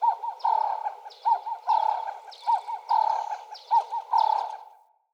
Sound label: Wetland Forest Day Dove; Midrath Island; Ambience